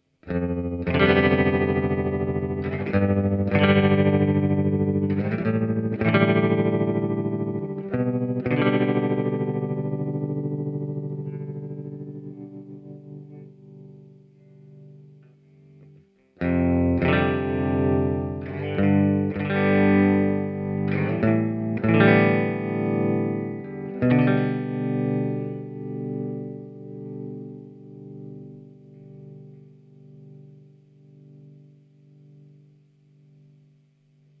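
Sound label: Fastest Slowest - Triangle waveform. Depth set to half. First the tremolo is played to the fastest rate, then it's played to the slowest.; empress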